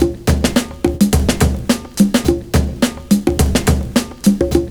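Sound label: INDIAN BEAT, 106 bpm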